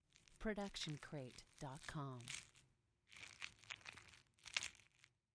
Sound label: squishy long; soundscrate